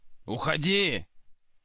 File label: celebrate, pillager; mob; Minecraft; Votive World